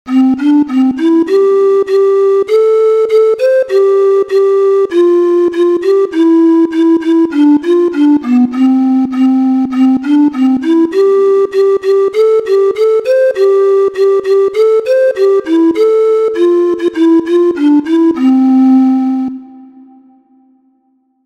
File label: MIDI